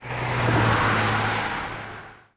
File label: Ambient Car Whoosh; Cars; XBOX